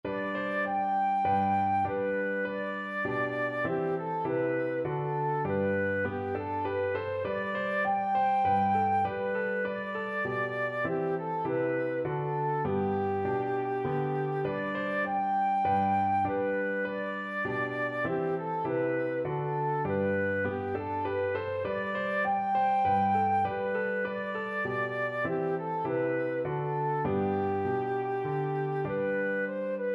minuet g, flute

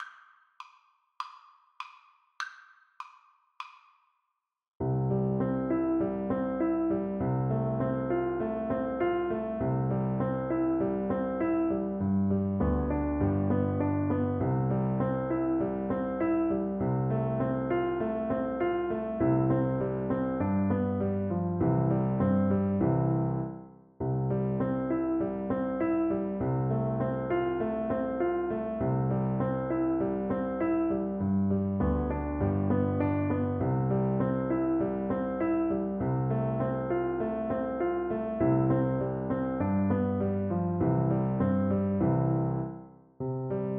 the possums tail is bare, Flute

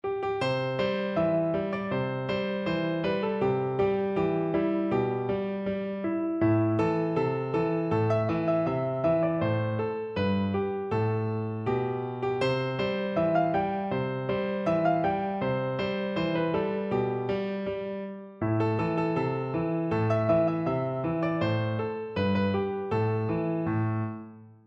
PNO, piano

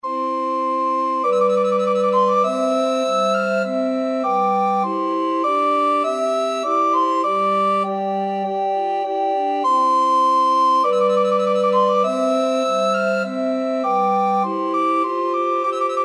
recorder quartet

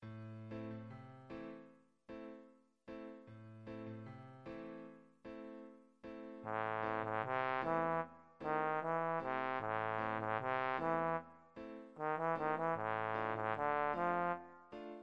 reggae, trombone

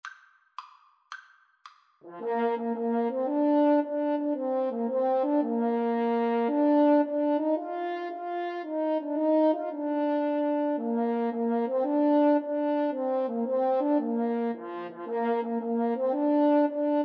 itsy bitsy 2HN, French Horn Duet

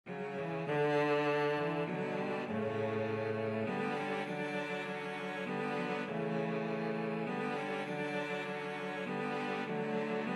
first noel, cello trio

